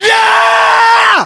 melee charge; ModernWarfare; localized english

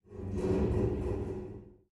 damage hits explosions, Warships

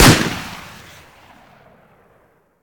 Weapons Flare; DCS

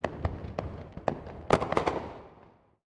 hangar

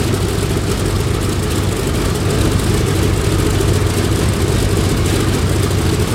side, engines; War Thunder

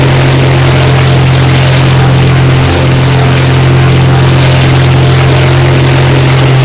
zlin rear, engines; War Thunder

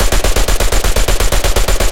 loop m1919, guns tanks; War Thunder

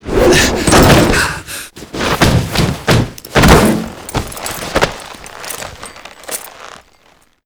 rescue; cargoship; Call of Duty Modern Warfare

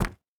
boar footstep wood hard; Rust

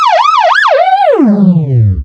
vehicle police siren; Call of Duty Modern Warfare

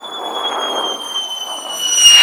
incoming, explosions; Call of Duty Modern Warfare

